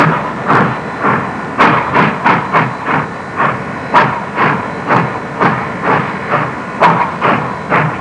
diesel; Sound Library 2000; Wayzata Technology

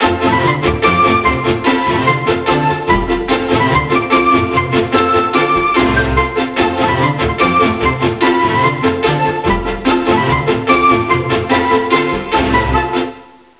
FLUTE

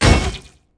sword

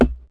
Cone

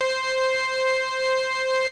Lead; Dance

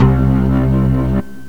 ORGAN

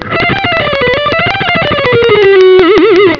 Lead; Cool Licks

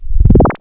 lo squirt, cartoon; Wired for Sound Pro CD; Aristosoft, Inc.

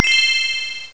chime

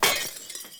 Cancel; frozen bubble

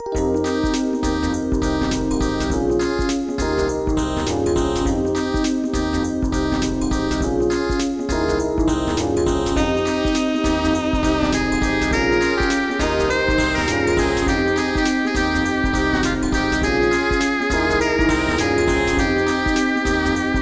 Groove

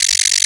scorpion; Shadow

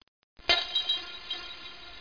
GLASS; Talking E-Mail

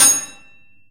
sword; kult

